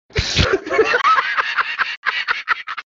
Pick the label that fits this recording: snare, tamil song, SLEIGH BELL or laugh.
laugh